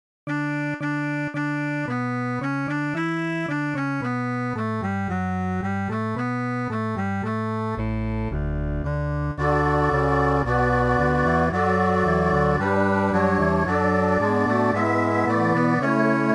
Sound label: MIDI